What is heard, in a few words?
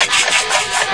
snickering